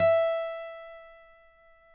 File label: Finger E 5 R, Guitars; Spectrasonics; Hans Zimmer Guitars VOL-1